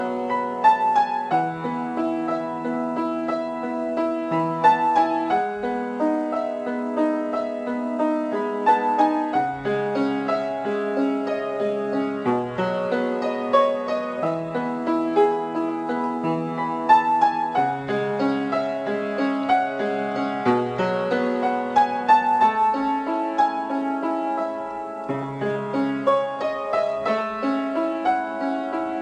Piano

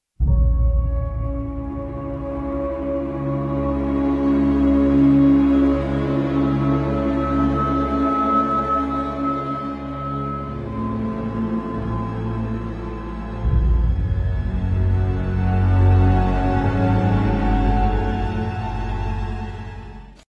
dramatic outro

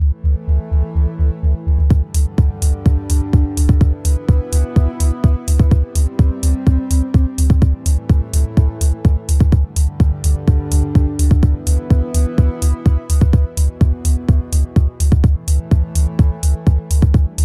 Chill ring tone

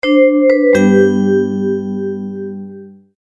SMS Notify Sound

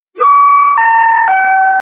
railway sms; ringtones

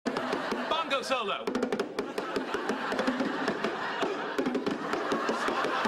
bongo

